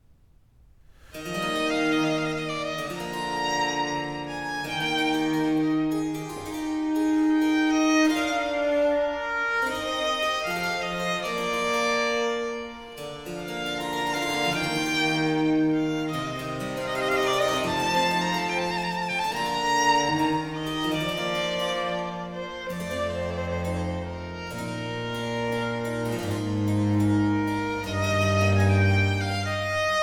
Sonate 4 in F major 1. Adagio; Arcangelo Corelli; Corelli - Complete Works; Klassika

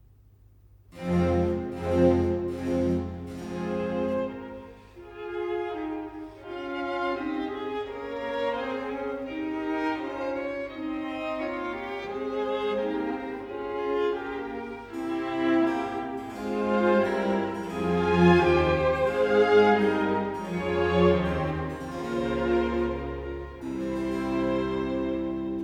Concerto Grosso in C minor, Grave; Handel Masterworks; Klassika

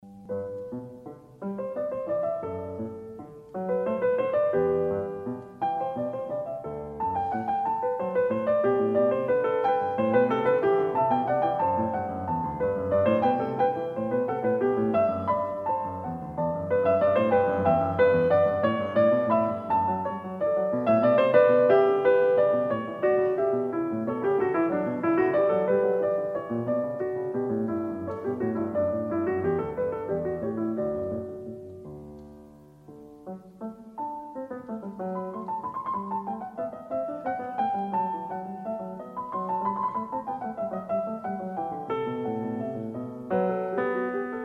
Bach Goldberg Variations

